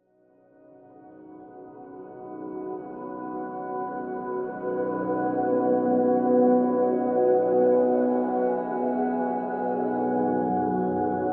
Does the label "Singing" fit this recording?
No